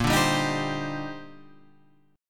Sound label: D sharp Major 13th, A sharp, guitar chord